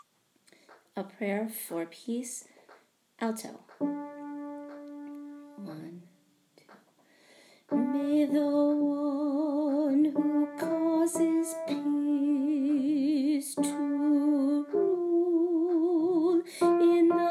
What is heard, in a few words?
A Prayer for Peace Alto; High Holidays